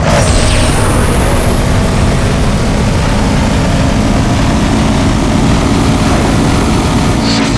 v8 turbo on loop; golf

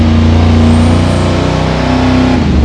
third, vehicles

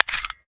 reload empty magout; gmod

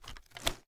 reload swing, sh; gmod; wfoly plr sh charlie725

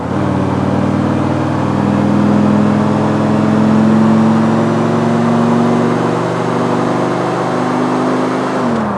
fourth, vehicles; schafter

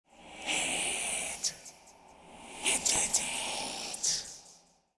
eyelander idle; gmod; durgz; meth

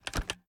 reload empty end; gmod

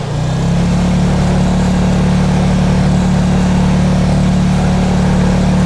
fourth cruise, vehicles; gmod; tdmcars; gtav; pol cruiser

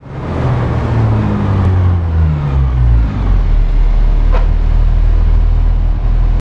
throttle off, vehicles; gmod; tdmcars; transit

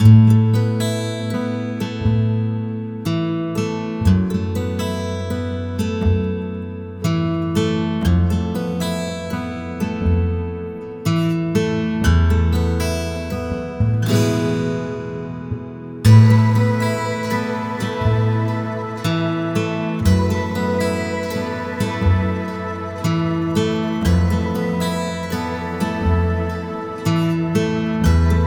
bgm

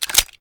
first raise mag in, reloads; gmod

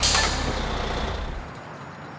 stop, vehicles; phantom

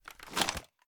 sm reload empty xmag end; gmod

